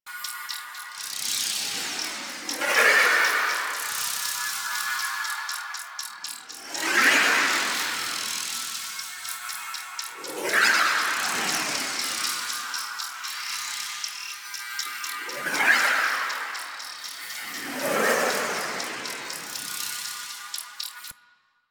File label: Anchor Loop; Warframe; Protea